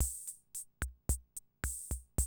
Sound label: CR78, DrumLoops